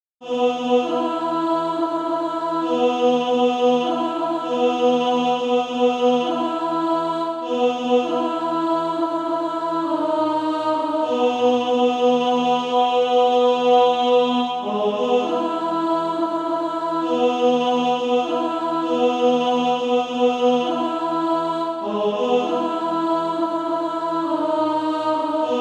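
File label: tenor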